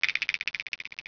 RATTLE

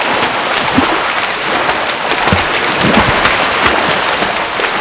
splash

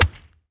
IRON

